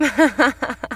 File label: happiness